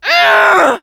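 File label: pain severe, French; Scout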